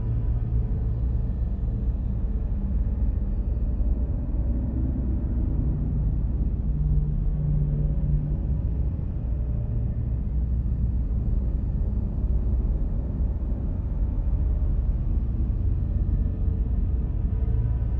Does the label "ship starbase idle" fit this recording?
Yes